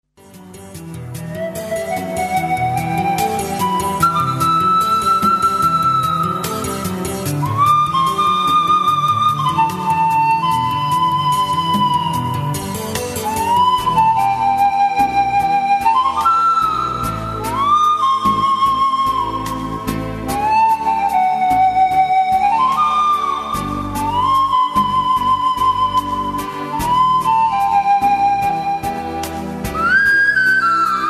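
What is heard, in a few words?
Pan Flute Solo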